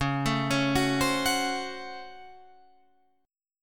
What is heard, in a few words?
C sharp Major 7th Suspended 4th Sharp 5th C sharp, Guitar Open F; arpeggiated; 13,16,13,13,15,13